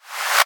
Reverse FX

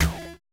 zap, Roland MC-909

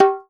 SKINNED UDU

